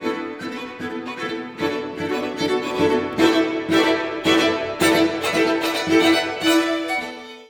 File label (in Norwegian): Udelt takt